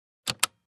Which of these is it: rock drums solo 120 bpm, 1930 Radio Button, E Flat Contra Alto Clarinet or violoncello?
1930 Radio Button